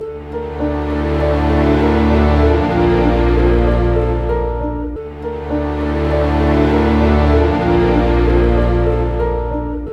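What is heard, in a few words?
orchestra doom; East West; Scoring Tools; Doom's Day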